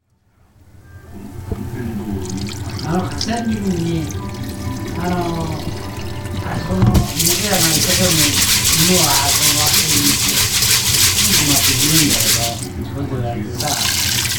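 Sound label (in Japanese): okome wo togu, washing rice; お米をとぐ; Chino Cultural Complex; 茅野市民館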